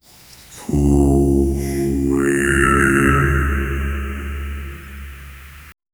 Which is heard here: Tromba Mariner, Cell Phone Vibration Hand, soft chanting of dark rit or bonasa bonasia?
soft chanting of dark rit